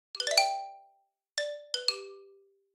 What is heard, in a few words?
Xylophone Gliss Up Down Flourish Slow; The Chris Alan; Gliss Bliss